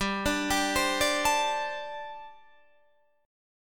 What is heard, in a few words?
G Suspended 2nd Suspended 4th, Guitar DADGAD